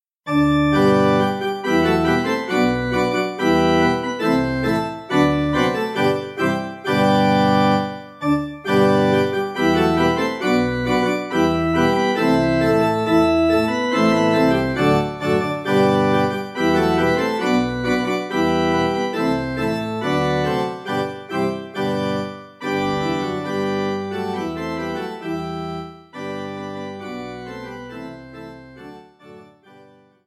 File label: accompaniment